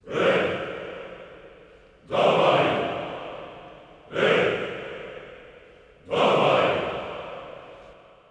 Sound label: Summer Action Chor; Freedom Fighters